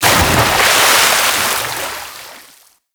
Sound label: Pirate Undertow Splash; Warframe